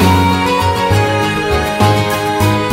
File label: loop